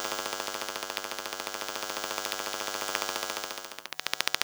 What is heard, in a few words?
Electromagnetic Fields